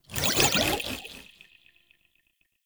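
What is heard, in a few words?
Power Shroom Projectile Whiz By; Warframe; Nokko; Empowering Mushroom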